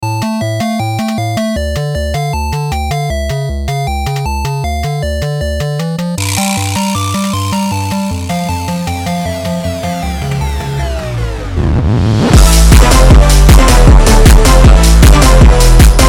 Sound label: tetris phonk